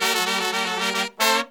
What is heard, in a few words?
Pop & Funk Brass, 110; Best Service ProSamples vol.25; AKAI